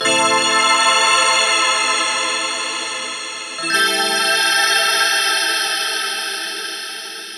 130 A Synth Digital Bells; Zero-G; Future Wave

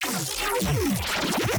Funny Neuro

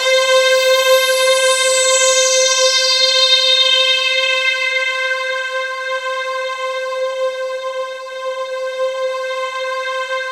ETHERAL-S, KURZWEIL K2000

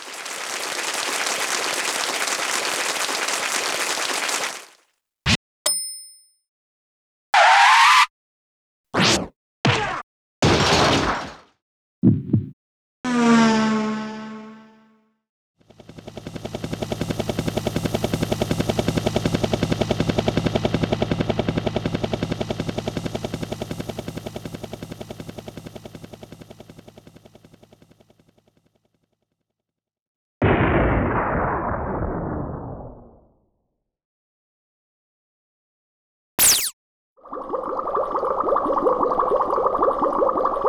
Roland Juno-D GM2 SFX Kit

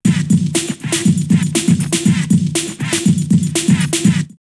Rare Beat 120, drumloops, Techno&House; X-Static Goldmine

